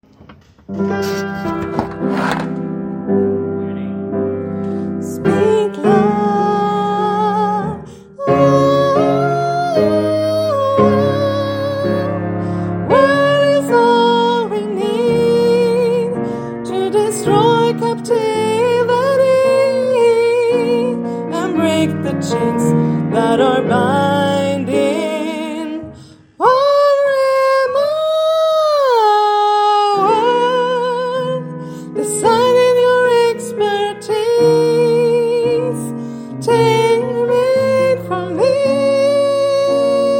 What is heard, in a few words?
Sopran; Stämfiler; brannkyrka gospel